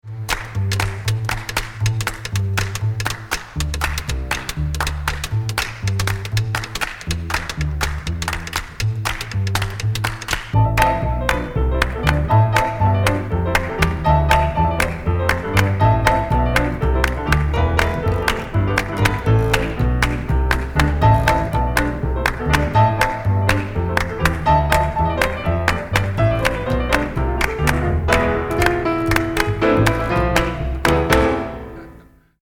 irregular metres

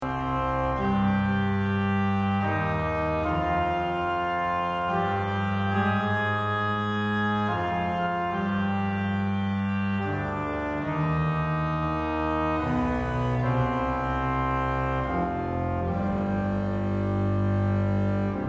passacaglia

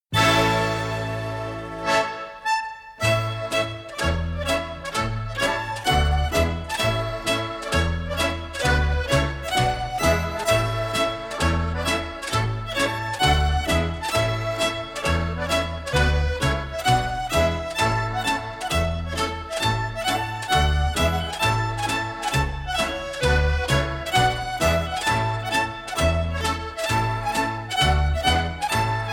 strathspey

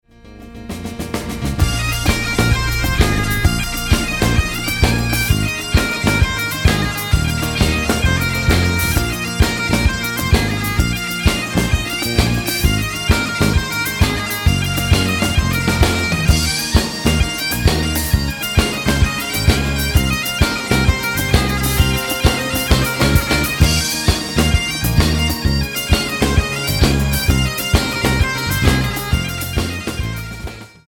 celtic rock

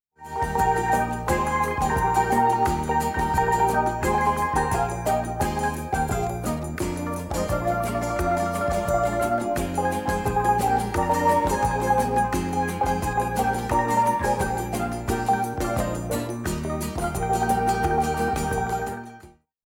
steel band

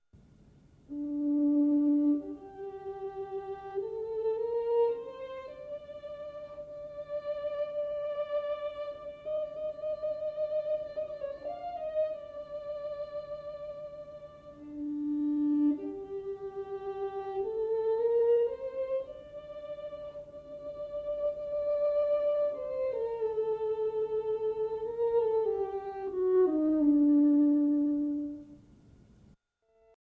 consordino

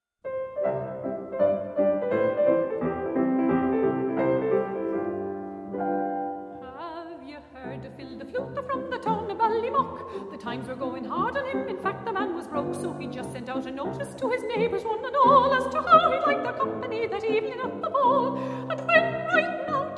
mezzo soprano

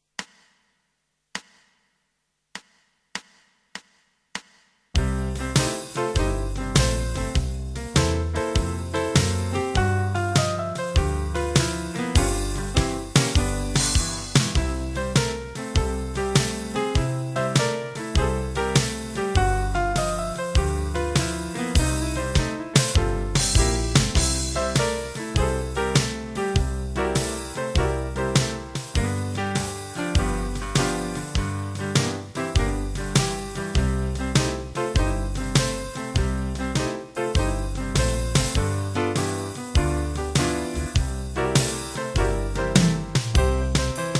Guitar backing

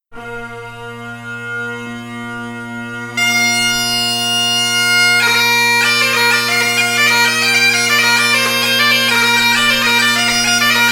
bagpipes

